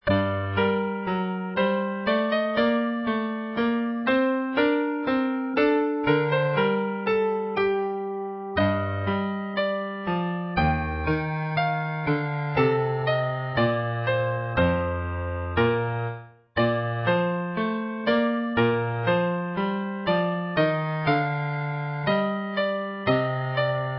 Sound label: Chorale Setting Dm; Classical; Bach; BWV511